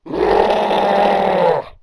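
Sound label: die, alien controller; Fatzombie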